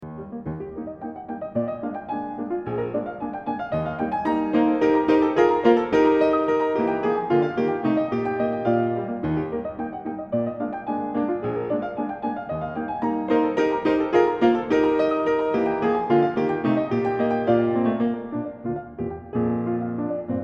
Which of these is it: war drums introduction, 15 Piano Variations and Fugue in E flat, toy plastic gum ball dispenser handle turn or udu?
15 Piano Variations and Fugue in E flat